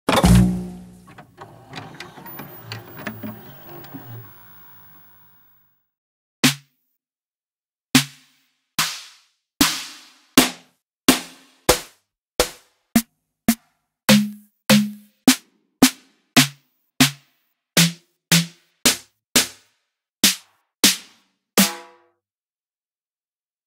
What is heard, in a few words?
ANALOG SNARE COLLECTION